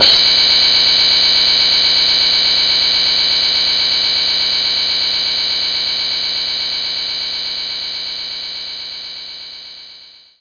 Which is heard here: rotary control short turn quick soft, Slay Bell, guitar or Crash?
Crash